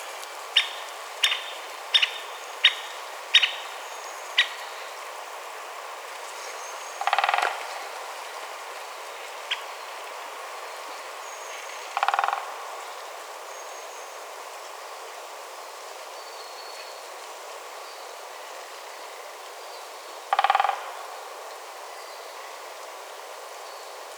fehérhátú fakopáncs hangja

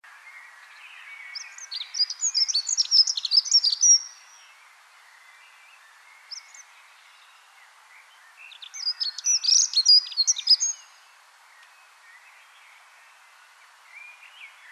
erdei szürkebegy hangja

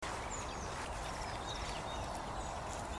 mezei pacsirta hangja

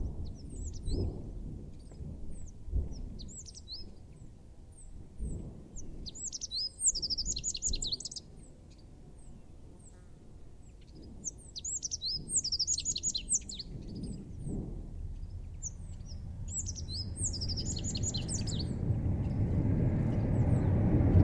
bajszos sármány hangja

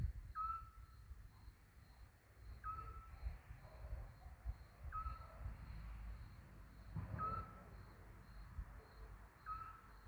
fuleskuvik hangja

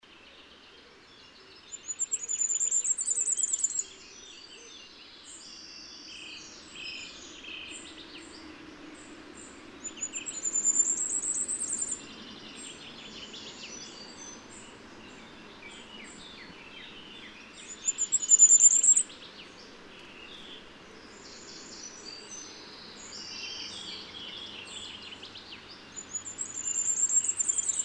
tüzesfejű királyka hangja

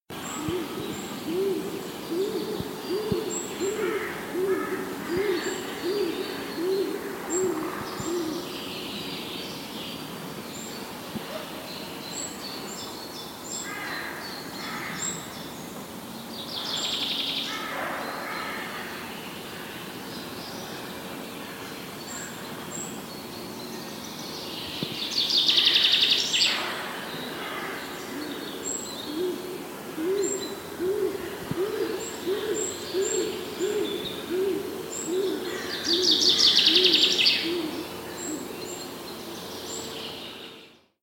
kék galamb hangja

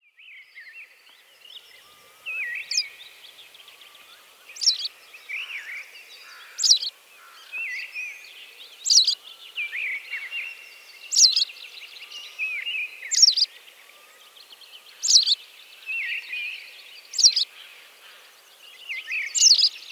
barazdabillegeto hangja

